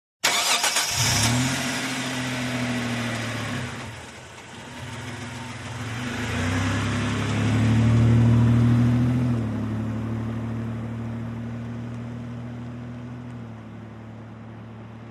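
Montero Start Away Slow, 1998 mitsubishi montero ext.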